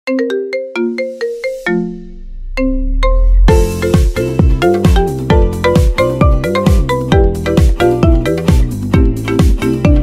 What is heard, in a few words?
marimba ringtone; one piece